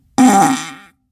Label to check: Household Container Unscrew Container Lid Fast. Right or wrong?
Wrong